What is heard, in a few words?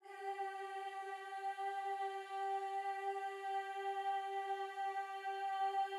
Female Choir G4; Synthetic's Serum Collection